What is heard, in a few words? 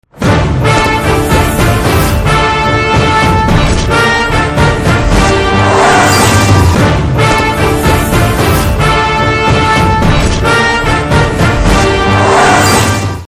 Trailer BGM Tone; Saamy Square